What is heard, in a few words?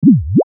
Mouseover Blurp Synth Descend Ascend; Multimedia; Blastwave